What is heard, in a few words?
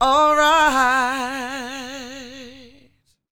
SING, Vocal Planet Blues Soul BLUESY